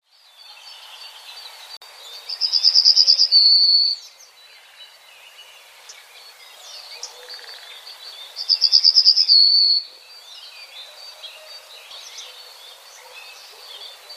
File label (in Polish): trznadel, prawdziwego trznadla; wroblowe; zapozyczenia